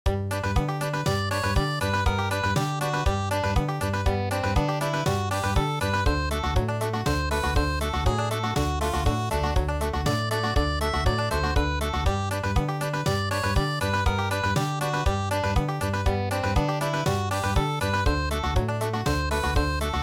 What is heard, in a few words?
Mexican hat dance Country